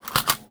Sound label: Foley